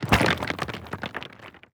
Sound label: masonry break; teardown; ps5 windows xbox series xs